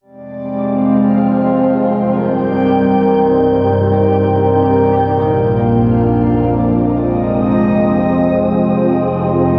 melody sample fmin 100bpm piano

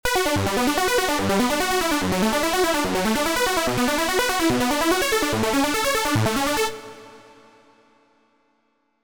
eurobeat lead

